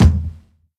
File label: Rich Mids Kick Single Shot E Key, Royality free kick single hit tuned to the E note. Loud; kick drum samples